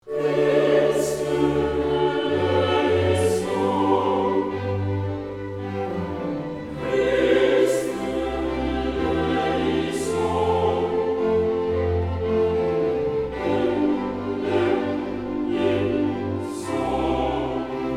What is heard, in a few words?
Missa Pequena Em D Kyrie Christe Moderato